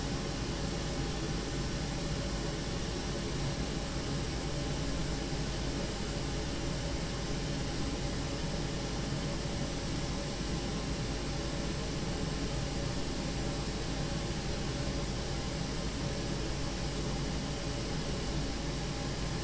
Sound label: Pink Noise